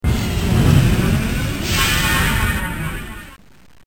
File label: Alien